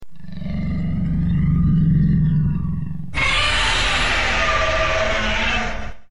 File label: roar; atari; godzilla